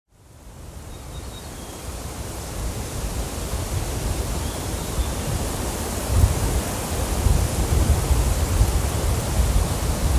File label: ambient background